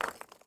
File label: drop charcol; Rust